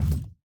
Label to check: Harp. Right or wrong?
Wrong